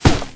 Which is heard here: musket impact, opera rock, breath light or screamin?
musket impact